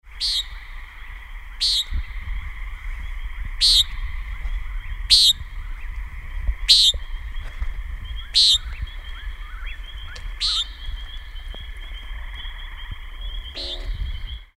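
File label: Common Nighthawk Whip-poor-will in background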